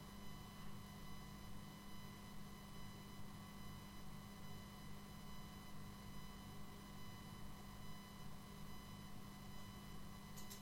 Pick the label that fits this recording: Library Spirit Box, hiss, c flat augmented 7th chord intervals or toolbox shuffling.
hiss